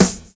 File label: SUB HH; Univers Sons - Kitch 70s